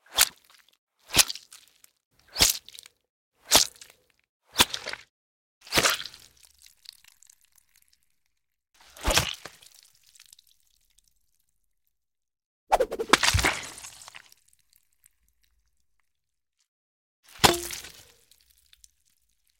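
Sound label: zvuki rezki, cutting sounds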